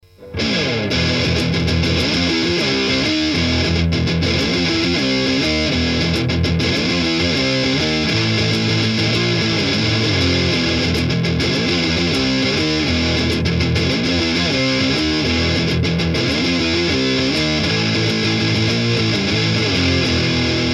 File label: Trash Metal; circuit demos